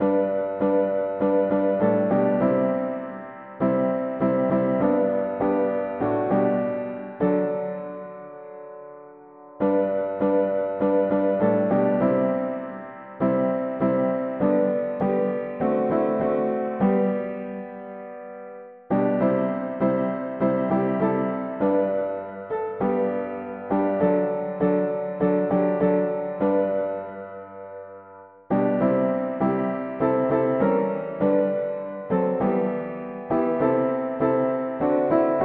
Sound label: mono piano, hymns